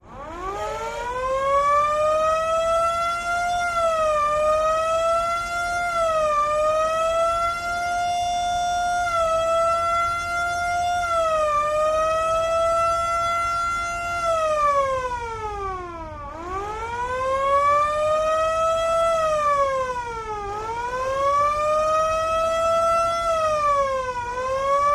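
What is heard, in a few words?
Hand Turn Siren Smooth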